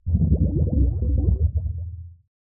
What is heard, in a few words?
Bubbles Underwater Large - Submergence; Hollywood Edge; sound designer's toolkit v2